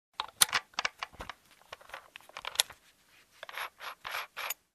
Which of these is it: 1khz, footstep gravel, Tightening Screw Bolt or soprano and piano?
Tightening Screw Bolt